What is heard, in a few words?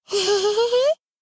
laugh